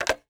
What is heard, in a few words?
Telephone Rotary Receiver Up; Communications